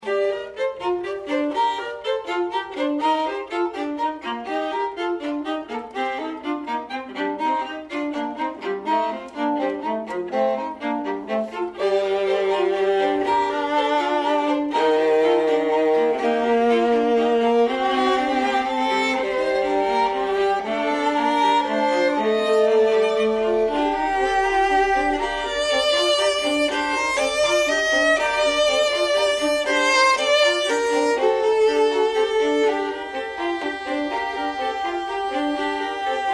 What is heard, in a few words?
trio violas